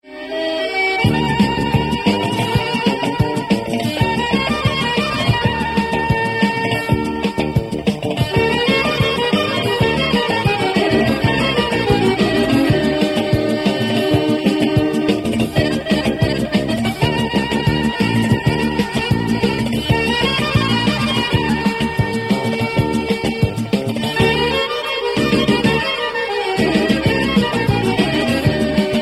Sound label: old serbia ethno, ringtone